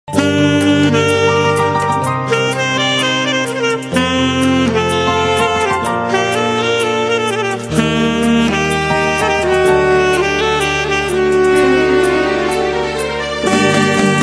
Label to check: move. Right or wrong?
Wrong